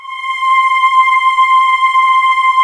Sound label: hoooooo C5